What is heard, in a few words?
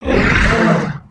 idle, creatures organic